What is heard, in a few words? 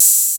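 808 HHOPN, open hi-hat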